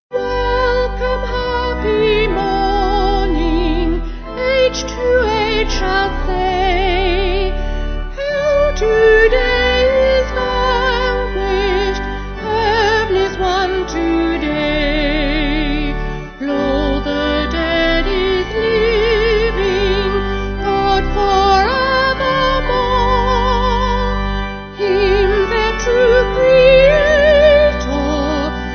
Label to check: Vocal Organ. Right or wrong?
Right